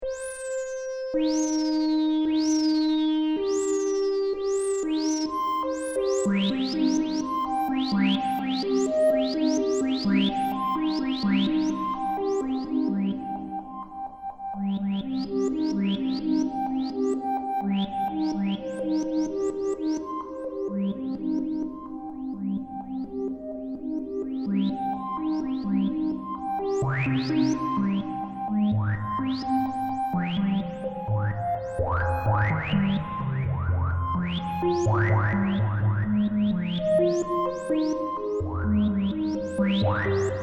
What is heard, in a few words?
JP8 Sync Arpeggio Slo + SD